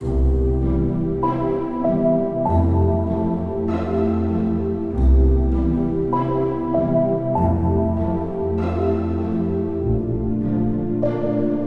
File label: BGM Menu; Endless Ocean Luminous